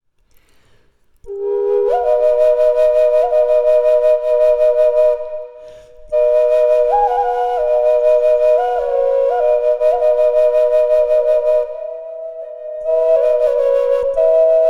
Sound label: Sumac Sunrise New drone